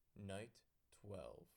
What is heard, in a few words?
night; mafia